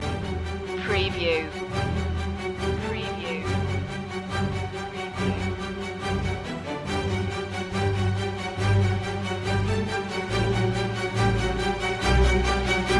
strings only

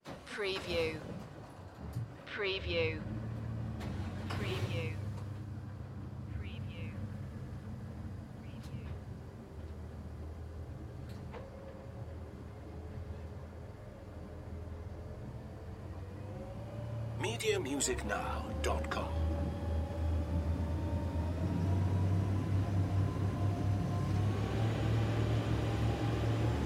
Boat Start Drive High; sound-effects-bible

